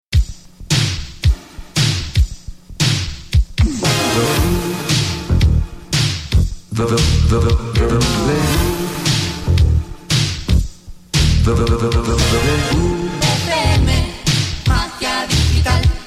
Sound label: Jingle, radio; 1985